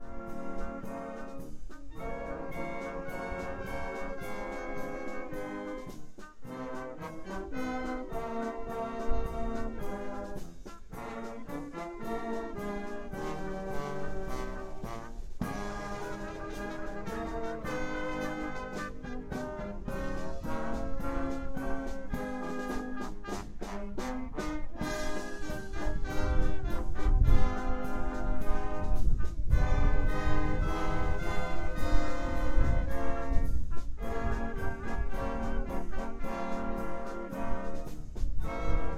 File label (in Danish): postorkester